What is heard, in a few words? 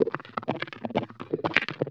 125 a, guitar licks; house; Vocal House; PS-28 Vocal House Samples; instrumentloops